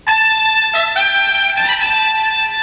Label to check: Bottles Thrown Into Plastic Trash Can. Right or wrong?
Wrong